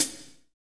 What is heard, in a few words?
HAT, Roland Fast Rock Hi-Hats; Northstar; Drumscapes Roland